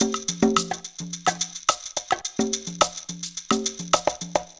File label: PERC LOOP 107, funky elements; Zero-G